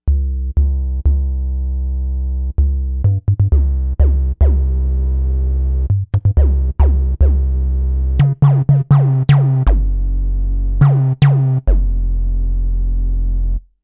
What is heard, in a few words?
Kick Dive, Novation UltraNova; Factory Patches